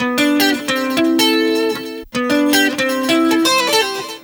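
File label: TOWNSHIP, S. AFR. GTR; Spectrasonics; Heart of Africa 2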